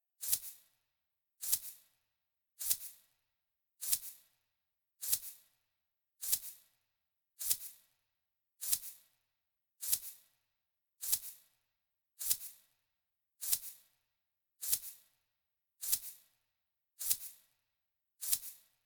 perc shaker; Toki Tori 2+; Toki Tori Collection

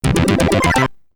Reach Destination, SID Stereo, commodore 64

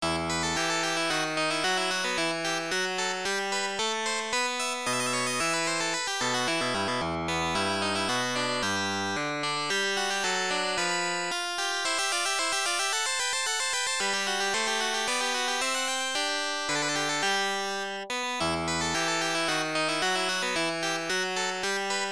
Church; Ys; Ancient Ys Vanished Omen; PC-8801

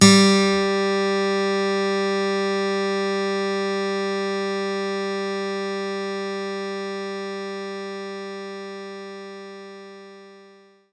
Steel Guitar G4; knights try

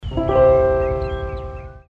Piano Sound; Dude Theft War; Android

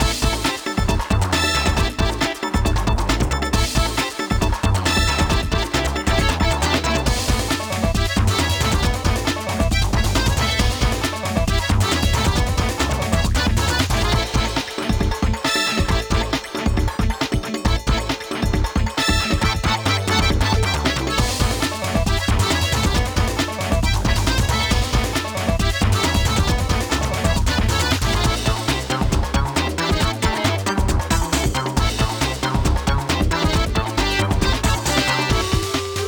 Samba; frogger ancient shadow

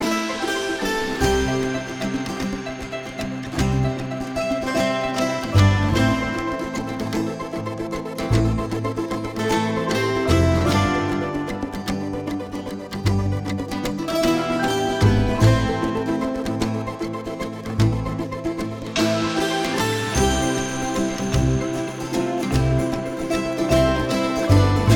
Synth Mockup

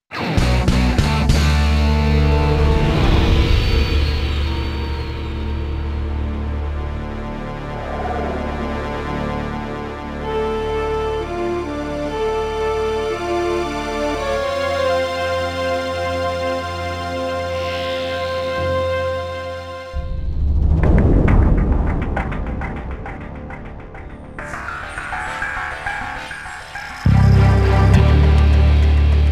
BGM Main Menu; marvel super hero squad the infinity gauntlet 2010 nds gamerip

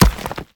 stone hit; Scrat

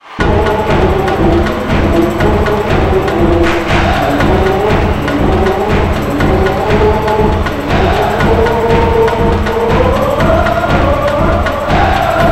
Chants

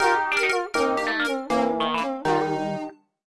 Defeat

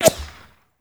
bullet flyby slow

